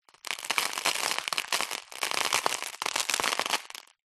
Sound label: debris crumbs or dust fall onto plastic sheet; zapsplat; foley